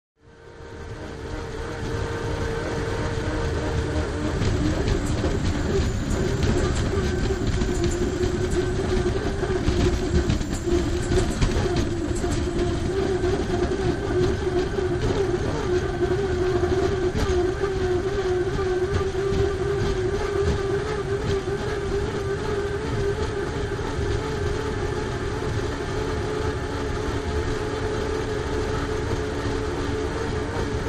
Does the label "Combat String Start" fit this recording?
No